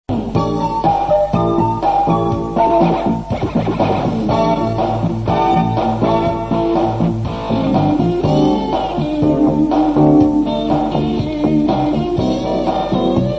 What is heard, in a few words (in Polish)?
Jingiel